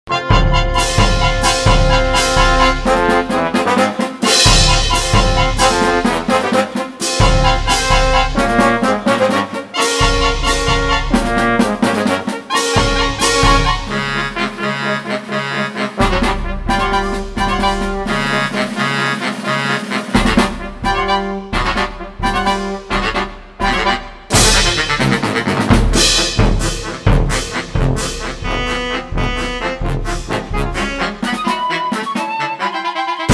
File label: fightin music faster